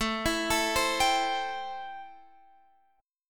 A-Minor 7th A Guitar Standard; x,12,14,14,13,15; Am7 arpeggiated; JGuitar

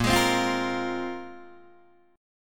A sharp 7th Suspended 2nd down Guitar DADGAD

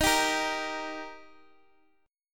Eb Major, down, Guitar, DADGAD

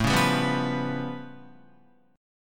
A Augmented 9th A, Guitar; strummed; JGuitar

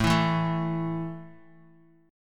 A 5th A down Bass Standard; strummed

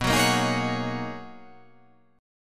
B Major 13th B down

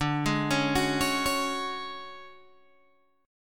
D Major 7th Flat 5th, D, down, Guitar Open G

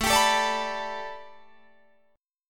A 9th Suspended 4th Guitar Drop D, chord

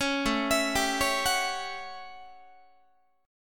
A 13th A down Guitar; A13 arpeggiated

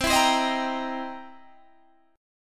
C 7th Sharp 9th, C, down, Guitar, Open G

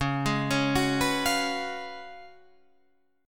Db 11th Db, Guitar, Drop D

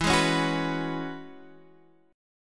E 6th Add 9th E down Guitar Half Step Down; E6add9

